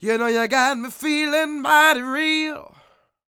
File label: SING W, Vocal Planet Blues & Soul, BLUESY; ILIO